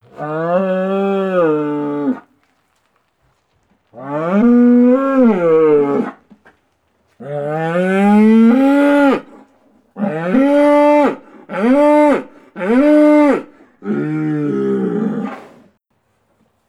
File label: Cow Moos Bellows; Animals; Sony Pictures Sound Effects Series Volumes 1-10; Sony Creative Software